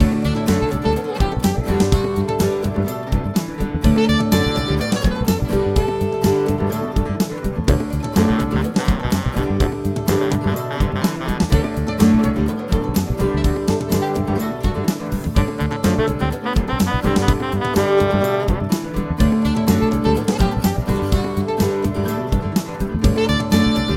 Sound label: Little Ditty